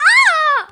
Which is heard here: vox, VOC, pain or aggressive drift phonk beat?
pain